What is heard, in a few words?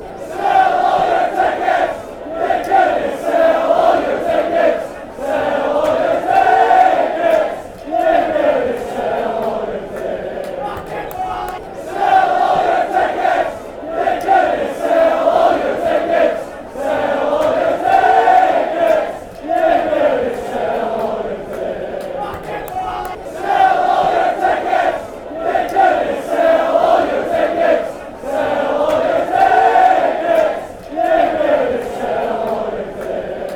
you couldnt sell all your tickets fanchants